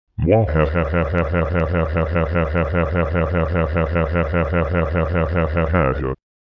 little guy laugh

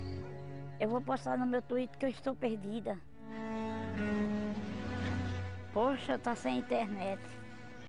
tweet

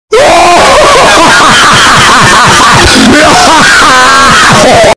laughing; demoman

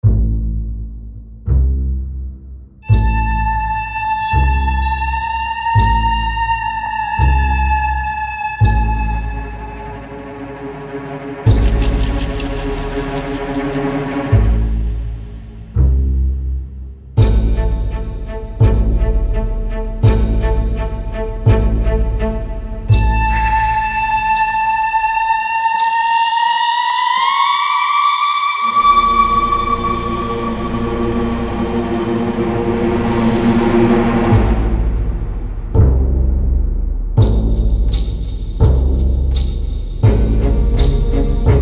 intense sneak into battle

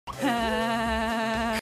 troll laugh; fenneko laugh; green screen; aggretsuko

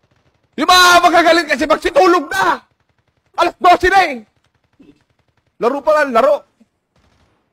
rage; congtv

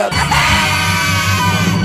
laugh compilation

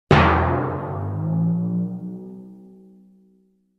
music; spongebob

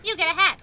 hat

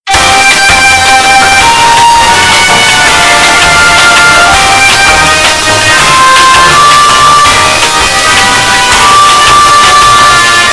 elevator music but its loud

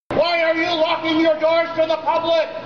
doors

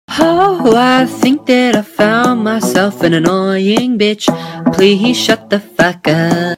cheerleader

